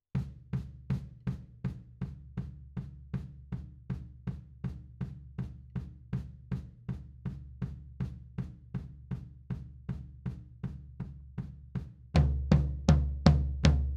Floor, 160 BPM

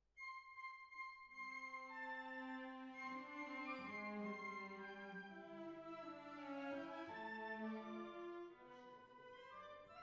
Cbasses

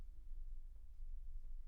Bass Bot

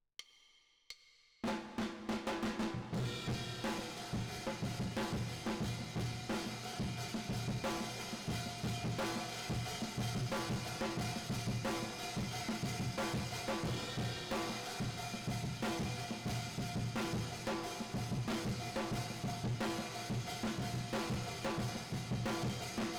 Drums Verb

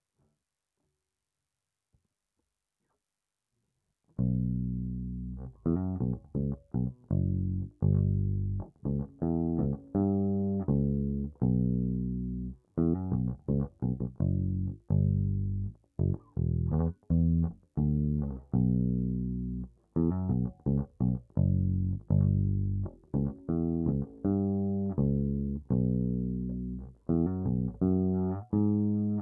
Bass DI

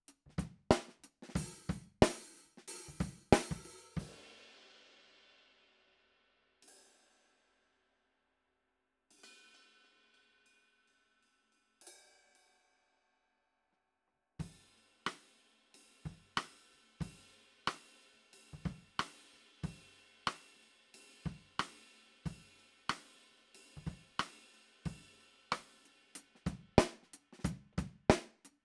ROOM mono

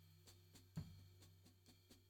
Tom

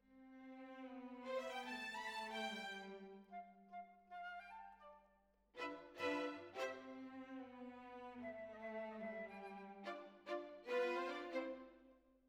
Violins Over

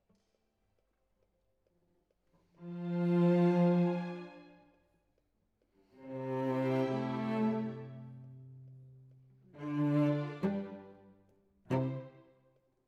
Cello Solo

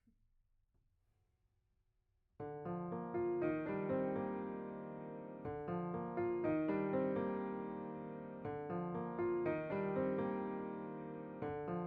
Piano B

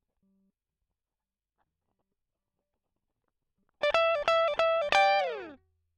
Guit Solo Line

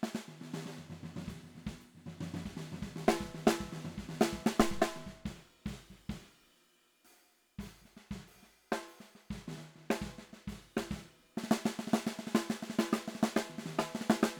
SNR Bot

